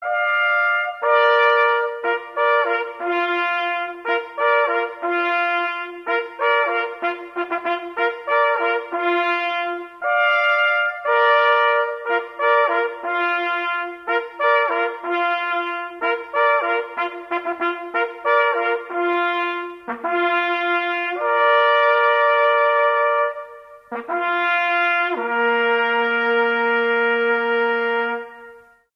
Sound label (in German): Hirsch tot, Jagdhornblasen, Totsignale; Brauchtum